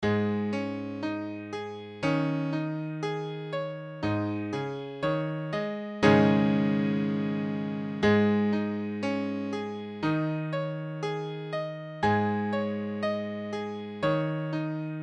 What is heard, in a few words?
Ab sus4, piano